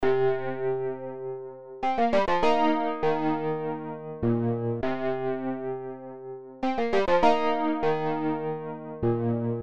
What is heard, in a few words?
e piano loops